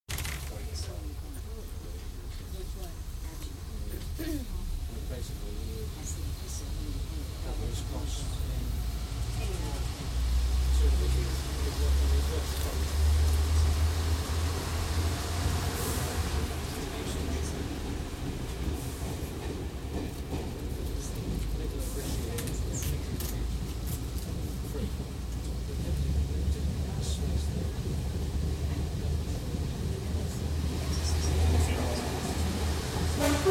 Diesel Commuter Railcar, interior, starts into constant run on welded track, with voices on welded track, several passing trains, slows & stops, doors; BBC